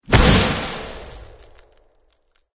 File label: defeat reaction hit ground; smite; odin